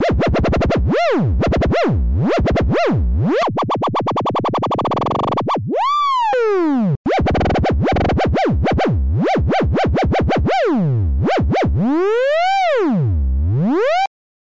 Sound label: Wobble Pitch